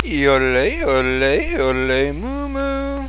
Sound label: moo